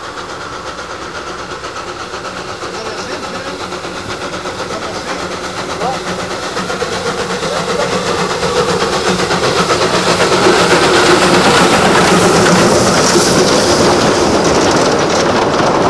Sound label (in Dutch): Mallet langsrijden; Mallet104